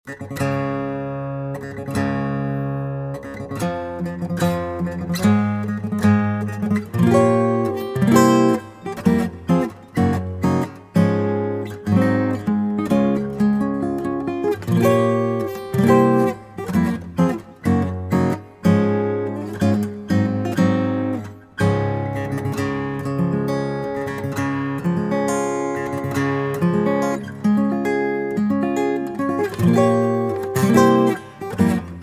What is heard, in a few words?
Guitar; Wedding March